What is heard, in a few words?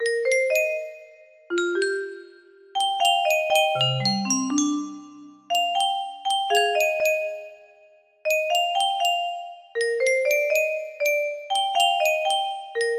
melody, music box melody